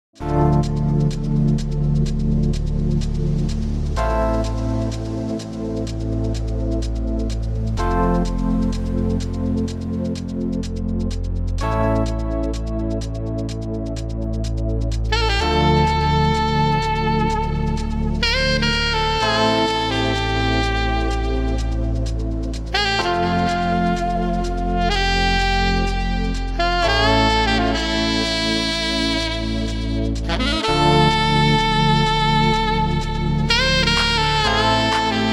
Sax Deep House; En Vivo

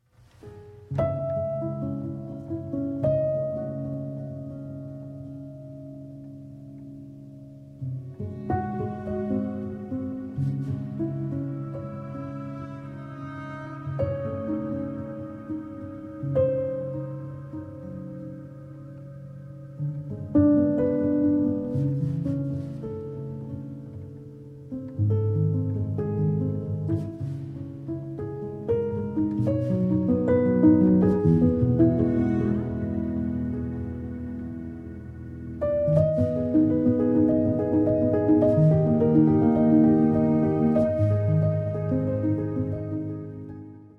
Waltz in C-Sharp Minor, for Piano, Violin, Cello; Op. 64, No. 2

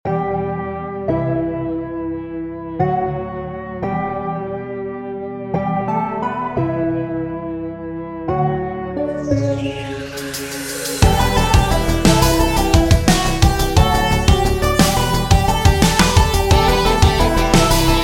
Instrumental

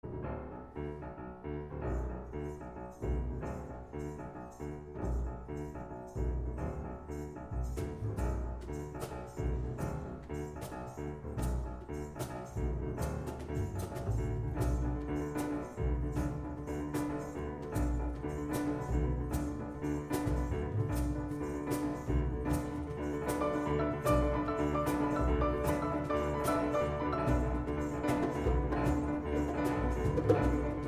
Berimbau; Nazareno I.; Arr. Gonzalo Grau

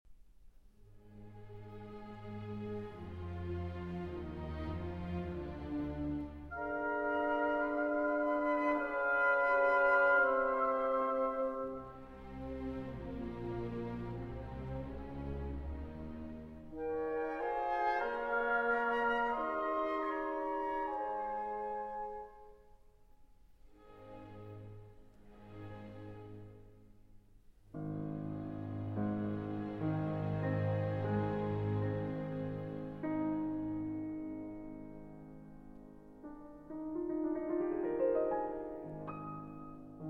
Piano Concerto in F Minor, Larghetto

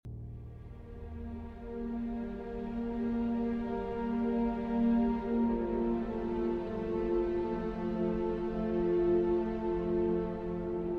Cello Version

